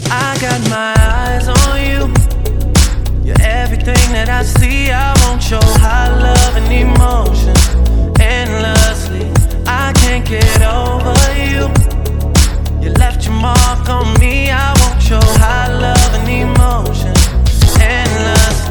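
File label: Body Spirit Music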